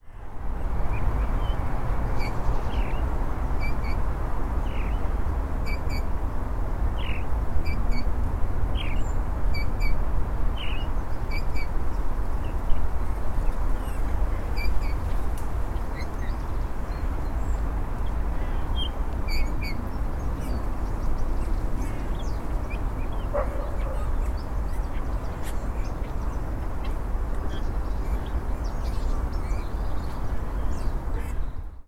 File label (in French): Bouvreuil pivoine; Ile Saint Germain